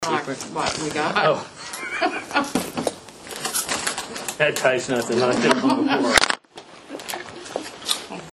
laughing

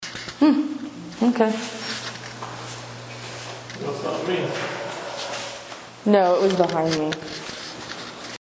4th floor scream behind voice, sanitorium; recorder

